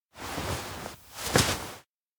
arm circle signal; aino

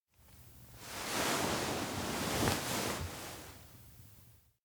futon shift small; anemoi

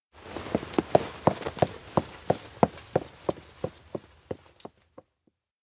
steps outdoor wheatfield leave heavypack; spica; anemoi

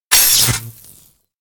elec shock through body; anemoi

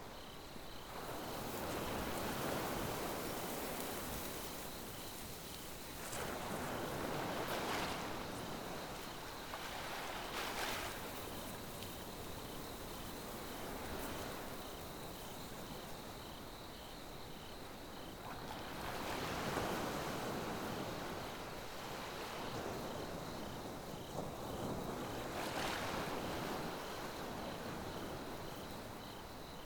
outdoor seaside night

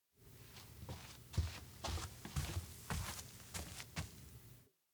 steps indoor tatami to dining; anemoi

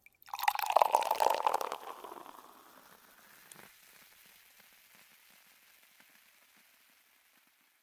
pour juice; anemoi

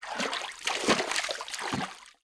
milk tank; anemoi

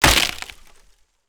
break wooden floor; anemoi

